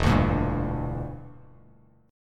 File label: E Minor 7th Flat 5th E down Bass Standard; strummed